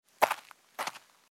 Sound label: Footsteps; Age of Empires III; cinematics